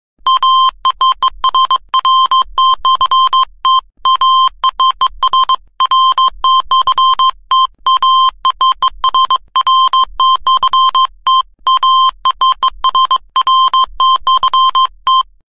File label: Morse Code